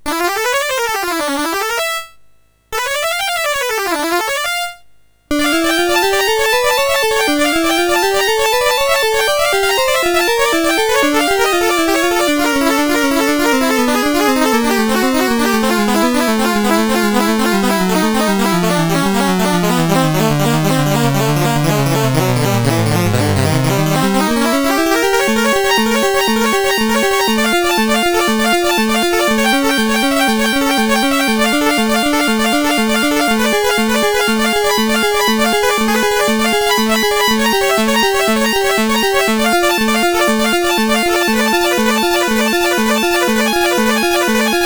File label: Chromatic Fantasy Variant, MOS6581R4